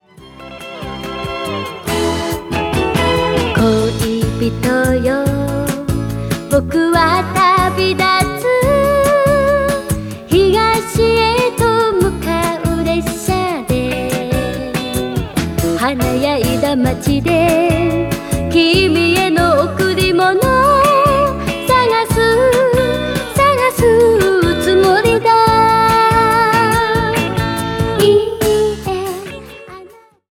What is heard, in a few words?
idol